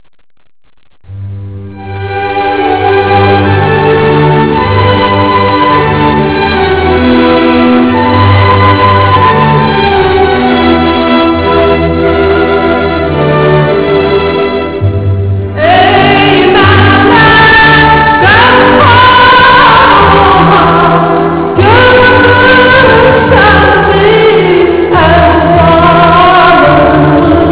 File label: duet